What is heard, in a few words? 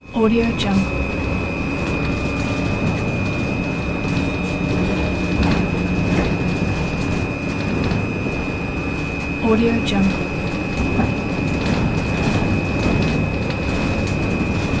electric trolley bus; crisstyna